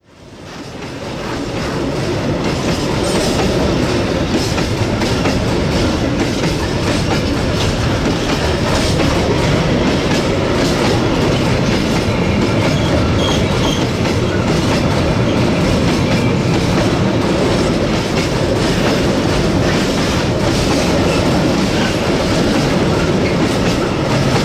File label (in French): bruit joints de rails; sonotheque